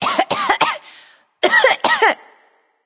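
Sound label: clear throat, female; smite; voicepack; hera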